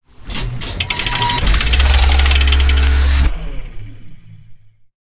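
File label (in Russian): большой робот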